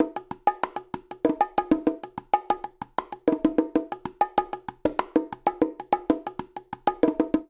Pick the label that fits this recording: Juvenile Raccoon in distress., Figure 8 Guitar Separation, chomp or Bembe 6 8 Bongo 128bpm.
Bembe 6 8 Bongo 128bpm